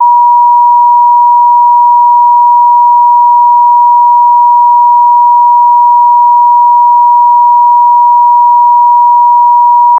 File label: urgent electronic alert